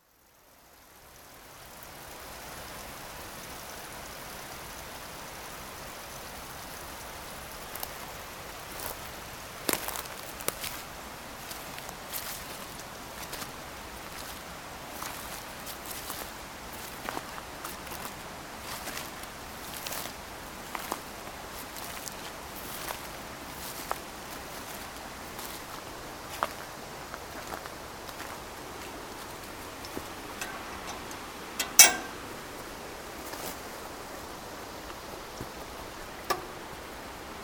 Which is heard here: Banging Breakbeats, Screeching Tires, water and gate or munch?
water and gate